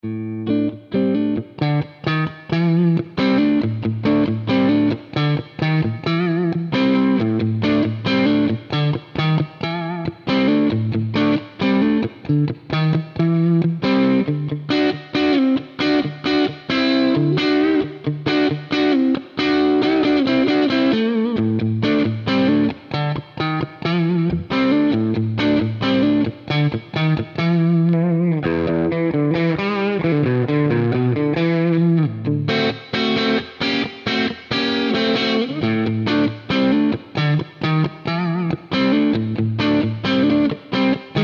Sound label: Rock single Neck